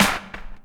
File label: SD, Dance Mega Drums; Best Service